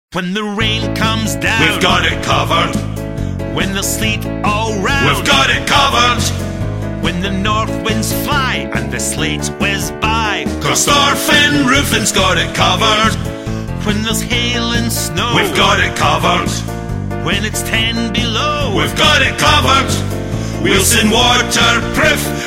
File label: jingle